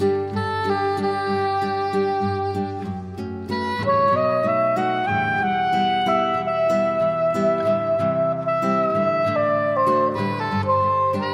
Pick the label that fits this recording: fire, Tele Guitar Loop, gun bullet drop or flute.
flute